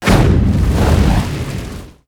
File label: fireball